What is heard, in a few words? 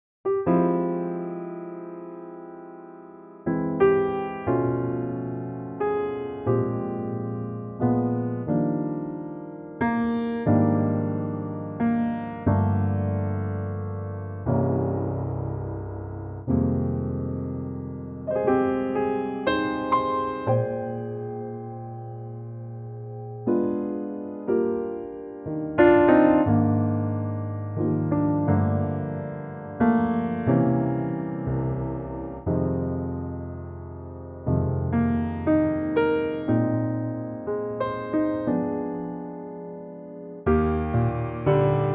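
Eb piano track; Pianotrak; key - Eb - vocal range - D to D; Beautifully intimate piano only arrangement of the main theme, the verse is not included.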